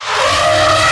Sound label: Elephant Tone